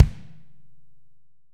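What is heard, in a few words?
AMB KIK, ambient kick, studio; AKAI S6000 CD-ROM - Volume 3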